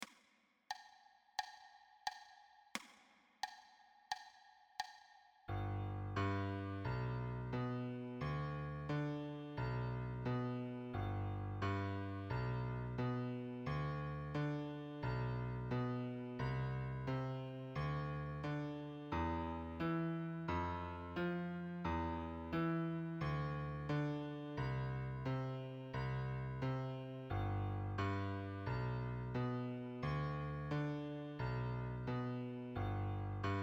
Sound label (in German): Lehrerbegleitung Rock Song; Klavierschule für Kinder Band 1